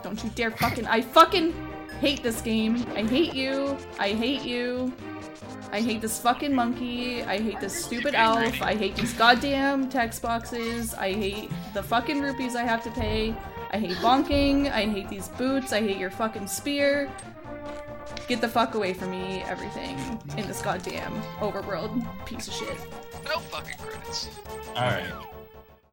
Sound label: rage